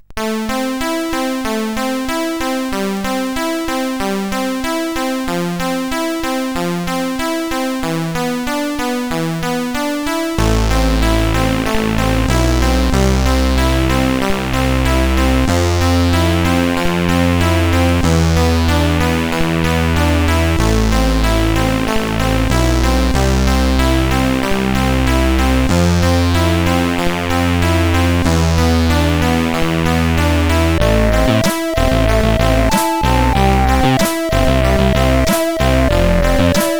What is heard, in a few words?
sid, CSG8580R5